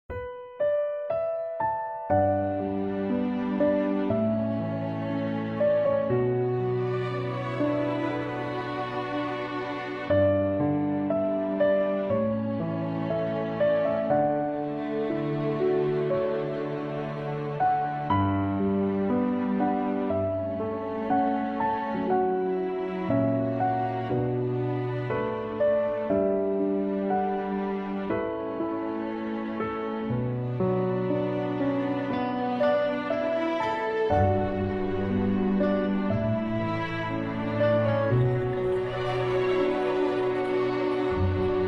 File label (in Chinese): 背景音乐